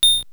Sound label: ice crack; P3D-Legacy